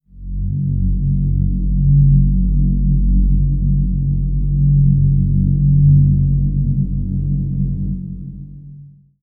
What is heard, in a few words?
Drone F#, Ambient; Drone 1; Trance Formation